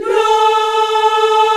VOX, African Aahs, African Las; Roland LCDP11 Africa VOL-1

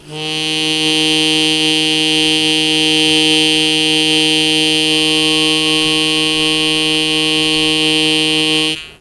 Long Sustain Aa Eb0, Harmonica; Zero G - Harmonica

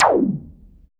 CYBER, Drums; Drums In Your FACE!; CYBER PRC

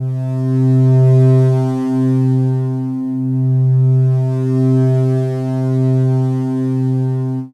syn c, Chillout Synth Atmos

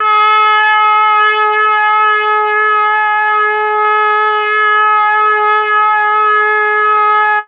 MEL BRASS; ILIO; Propeller Island; Legendary M400

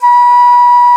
alto bansuri; E-MU Producer Series Vol. 7 – Old World Instruments

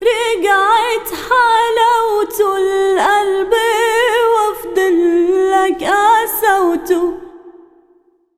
SINGER, ArabianTraditions; USB Soundscan vol.43; AKAI

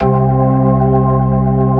P ORG2 C2, POWER ORGAN

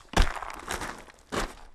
Rocky Step, outdoor, Footsteps

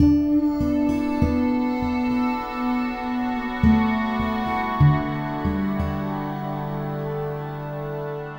Guitar And Synth

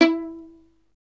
STR VLN, Solo Instruments; Miroslav Vitous

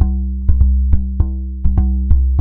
DRUMLOOPS, Korg; Back In Time Records; Korg Universe VOL-1

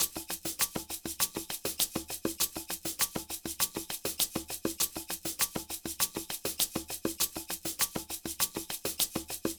Ensemble, Latin Percussion

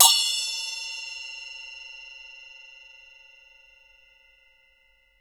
BO OPN, PERCS-KIT; INIS - Opium

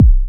ANALOG KICK

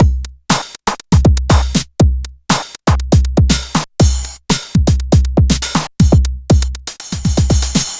CLUB; Wizoo - Platinum 24 Electronic; DEMO KIT

